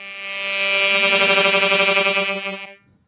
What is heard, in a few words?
harmonica note; Harmonica Multisampled